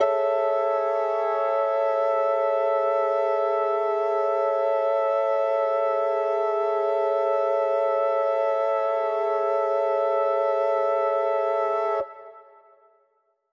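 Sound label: Mixed Winds A major 7, synth orchestra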